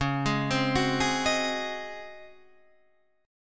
Db Major 7th Suspended 2nd Suspended 4th, Db, Guitar, Standard; DbM7sus2sus4 arpeggiated; JGuitar